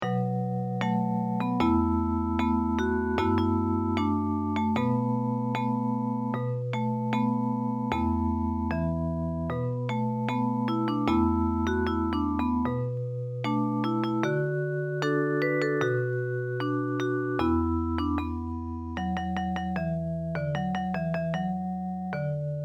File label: japanese folk song; kaigara bushi